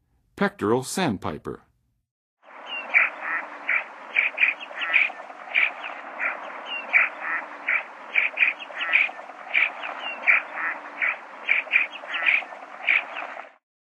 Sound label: Pectoral Sandpiper, Birds of North America; Western USA; Western USA Songbirds